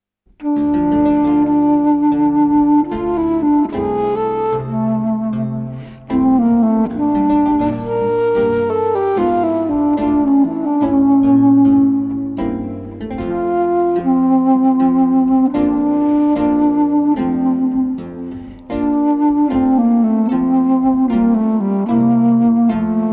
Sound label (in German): basso, querfloete; giovanni-battista-pergolesi